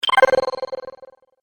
Magic Purchase Vibrate Ding; Cartoon Sound Design Sound Effects

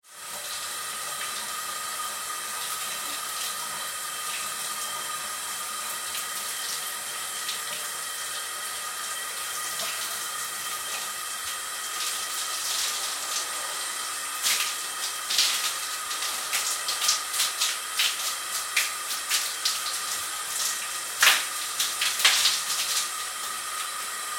Water Shower Run; Bathroom Sound Effects; Bathroom (Sound Effects:Household)

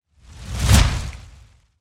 Fire Torch Pass By Large Heavy Crackle Whoosh; Flames

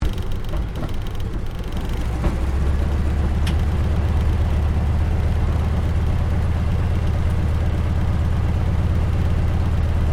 AIRCRAFT CAC WIRRAWAY TAXI INTERIOR; CA-16 Wirraway; Airplanes; Vehicles; Aircraft Collection; Twistedtracks

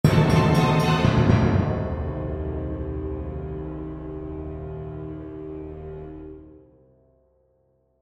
Accent Orchestral, Accent Orchestral Dramatic; Accent Orchestral Ascend Dramatic; Blastwave

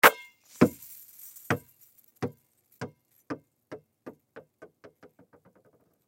Basketball Swish Chain Net Rattle; Basketball Swish Chain Net Rattle Ball Bounce; SoundEffects-Blastwave; Sports

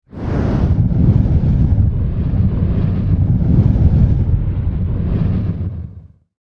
Fire Fireball Wave; Flames; Objects

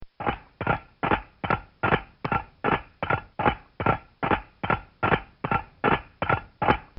Cartoon Sound Effects Gallops; Cartoon Sound Design

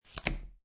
Playing Cards Deal Single Card Turn Or River

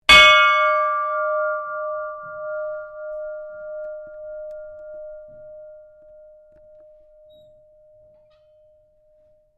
SHIPS BELL IRON RING

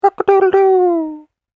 Rooster Animal Impersonation; Rooster Sound Effects

